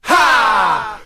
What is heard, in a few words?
"ha" group of men; ooh ahh